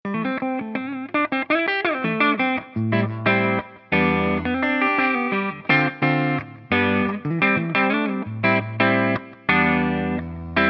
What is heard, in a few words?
System Comp Vibrato Delay Clean